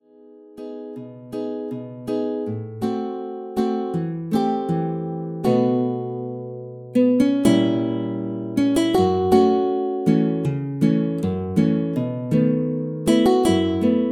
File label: synth